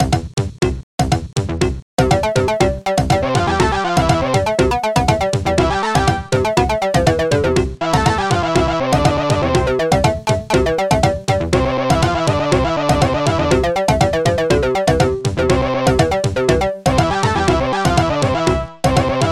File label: oriental